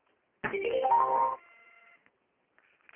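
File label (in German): störung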